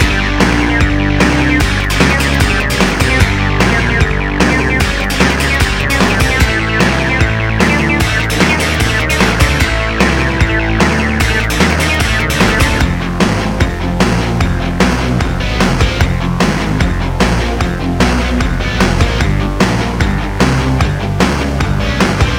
Menu Character Select; Blazing Chrome

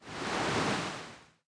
Water; The Denpa Men; Android

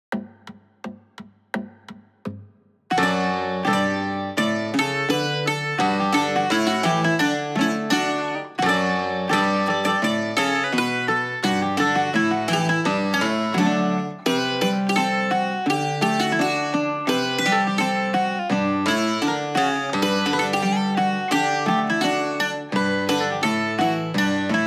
Duet; Gust 20th Anniversary CD Box

